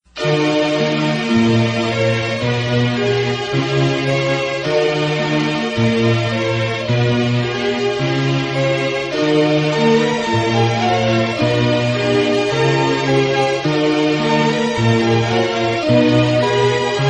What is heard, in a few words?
Instrumental